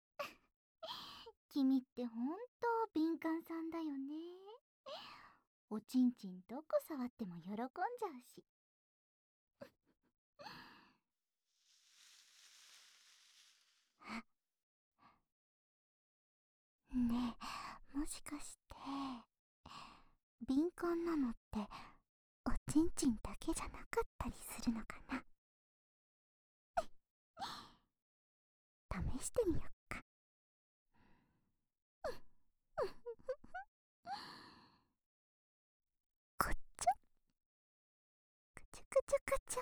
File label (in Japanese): くすぐり; サブえっち編(2); お姉ちゃん係 あまあまいじわるお姉ちゃん編